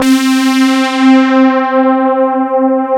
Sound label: CMI PIANO